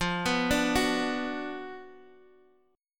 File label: F sharp Major 7th Suspended 4th Sharp 5th